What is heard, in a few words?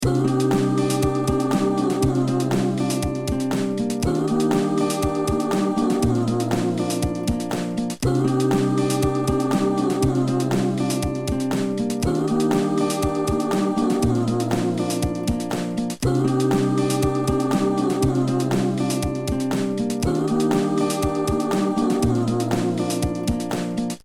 U73 COMP